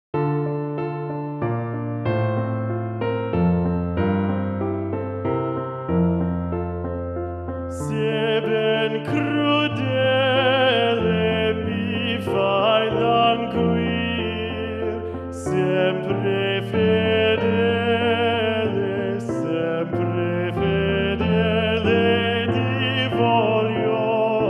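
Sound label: sebben crudele, d minor, chorus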